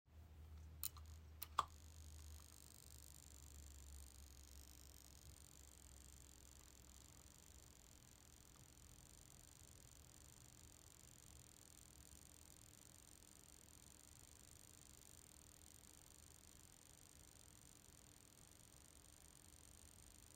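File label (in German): Brummen PWM Schaltnetzteil, Electro Voice ZLX 15P; Electro Voice ZLX 12P / 15P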